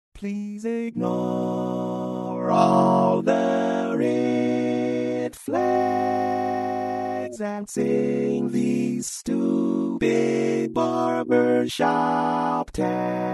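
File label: Baritone, barbershop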